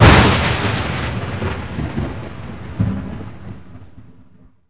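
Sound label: thunder close; envrn; The Elder Scrolls III Morrowind GOTY; XBOX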